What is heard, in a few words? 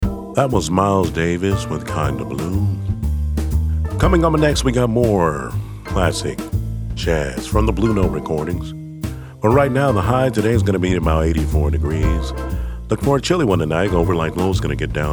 Jazz